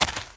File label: Panda Walk